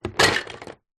leisure toy train wooden track pieces in plastic box down on surface